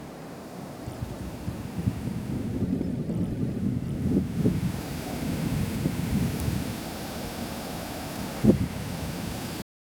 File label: wind with faint plant hum; alarm